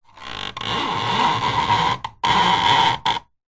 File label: household blind venetian cord pull close up creak